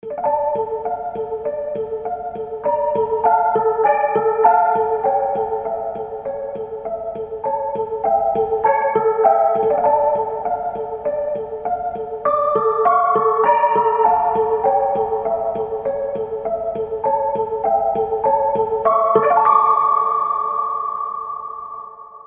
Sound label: Piano; Halloween